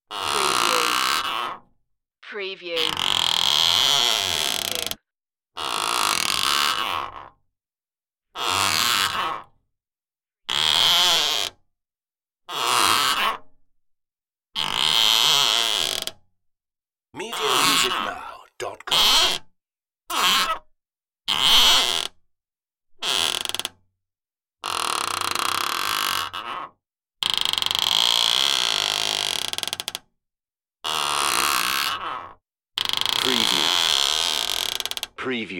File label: flap creak